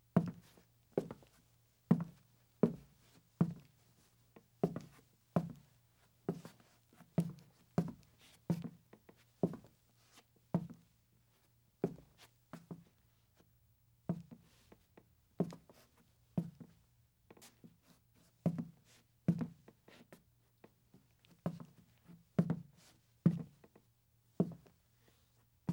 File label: Boots on Wood; Fairlight Sound Lib